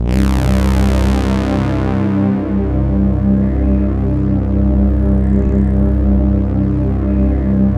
SYN K-2000, Roland

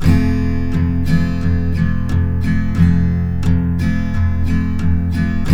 TK MJ F#2, Guitars; Spectrasonics; Hans Zimmer Guitars VOL-1

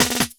LOOP SD; Ueberschall; Drum & Bass Resonance

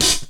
HH FOOT; USB Soundscan vol.27; Ragga & Dub Reggae; AKAI

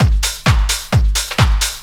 drum 130 trance

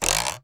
RULER; Rarefaction; Poke in The Ear With A Sharp Stick

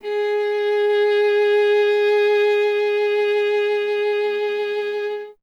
viola G; Miroslav Vitous; Solo Instruments VOL-1

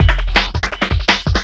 Beat, Drum N Bass

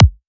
clean kick

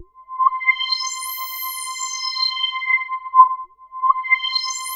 Korg POLY800 C5; Back In Time Records; Korg Universe VOL-1

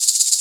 Maraca; Planet Earth; Digital Sound Factory Planet Earth

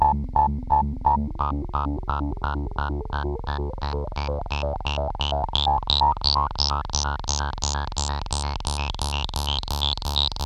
EMS SEQ; TECHNO-BOX

